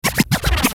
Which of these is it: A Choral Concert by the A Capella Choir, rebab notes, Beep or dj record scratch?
dj record scratch